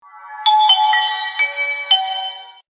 nokia n series startup sound classic mobile boot tone